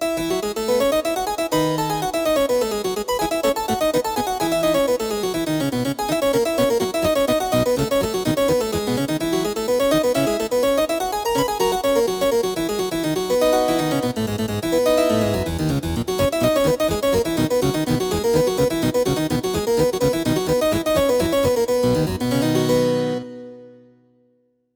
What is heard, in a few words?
harpsi, yamaha tyros3